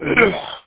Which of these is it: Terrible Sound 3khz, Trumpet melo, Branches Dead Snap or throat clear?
throat clear